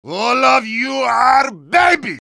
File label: yell; heavy